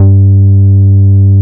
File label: JUNGLE BS G3, JUNGLE BS